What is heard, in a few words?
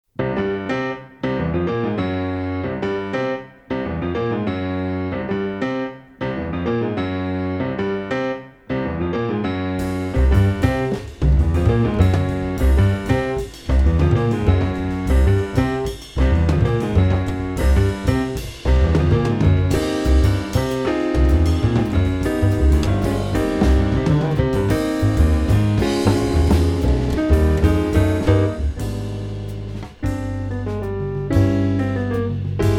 preludium c-moll